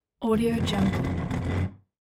wooden chair drag

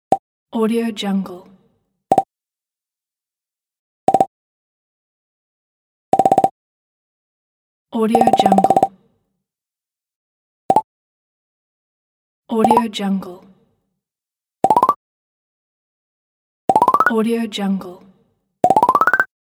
pops

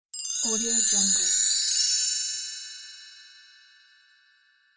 christmas bell tree; wistansound

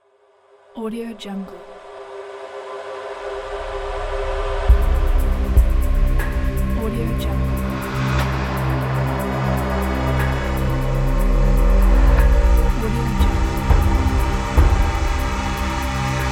spirits logo

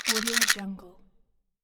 pill box shake

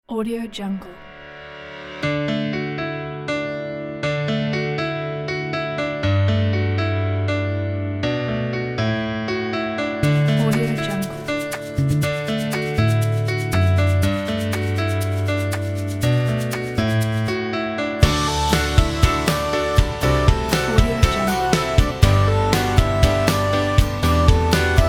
upbeat folk pop; shymonmusic

